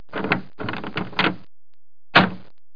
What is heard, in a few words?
door